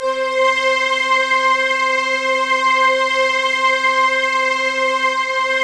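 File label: Orchestral Choir Stack; Optical Media International; Sonic Images Library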